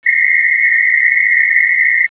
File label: 400Hz narrowband noise